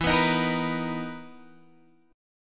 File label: B Major 7th E; strummed